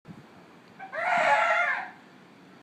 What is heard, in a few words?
Guadalupe fur seal